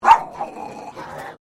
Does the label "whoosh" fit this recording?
No